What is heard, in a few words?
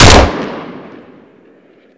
mac10; weapons; gunsound; cs16; cstrike